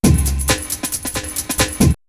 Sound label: break